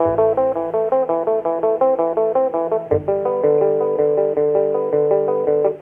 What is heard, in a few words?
guitar, Loops